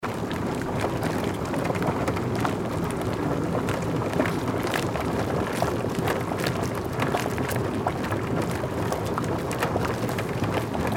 boiling water

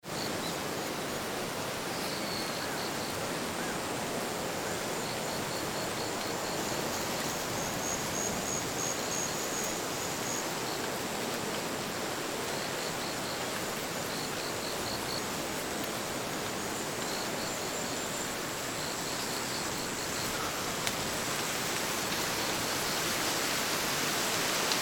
sugar cane

